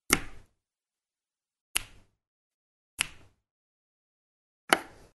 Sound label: usb connecting